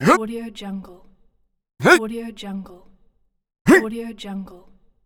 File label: male jump